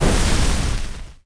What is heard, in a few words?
Fire; ChooChoo Heroes